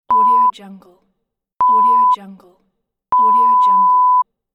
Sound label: censorship beeps